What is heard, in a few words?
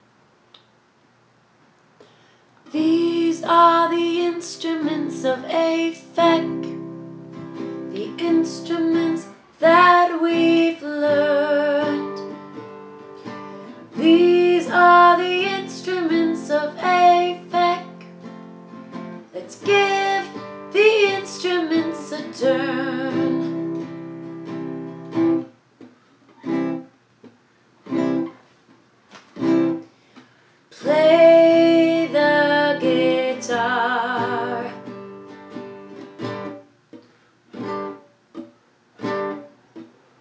song w vocals